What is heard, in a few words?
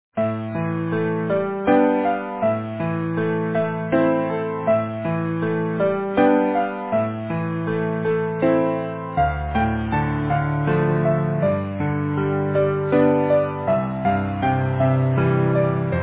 Easy Piano